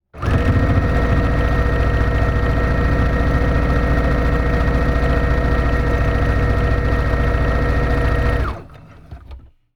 sewing machine long run at full speed lift up safety latch lever; Electric sewing machines; Omar Alvarado